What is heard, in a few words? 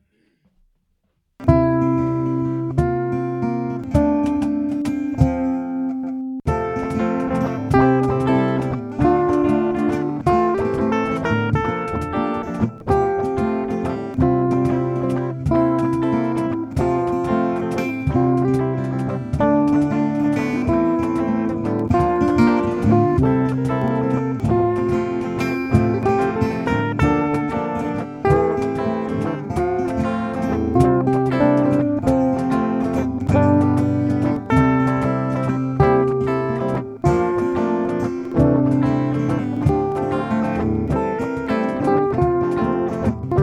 Irish Blessings Variations